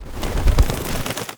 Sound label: Bird Three Wing Flap; Warframe; Entrati